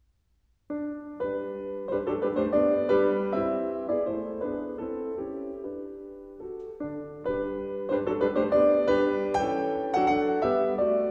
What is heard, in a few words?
for piano Allegretto; "Christmas Pieces"; Mendelssohn